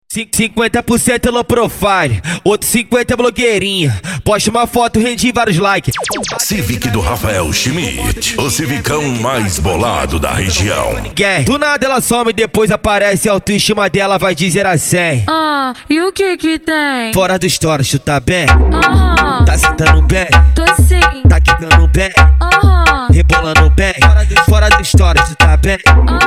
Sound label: funk mega bass